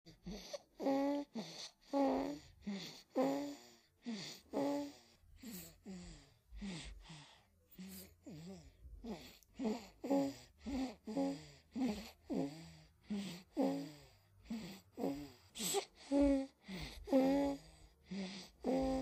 Baby Sleeping Heavy

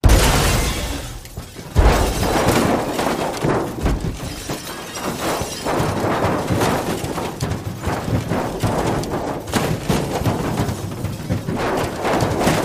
Car Rollover

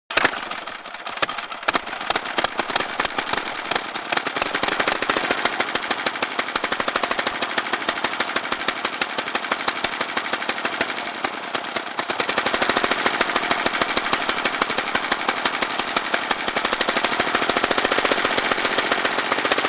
Start Idle Off Old Mot, vintage

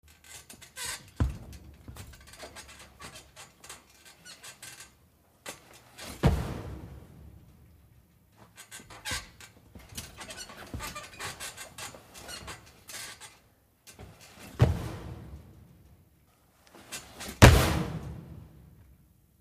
Body Getting On Off, bed movement

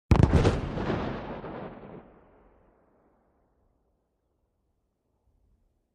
1 Pound Pipe Bomb

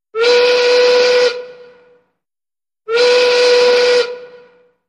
Factory Site Whistle, horns sirens

